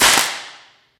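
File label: physics clipboard impact large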